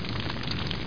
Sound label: torch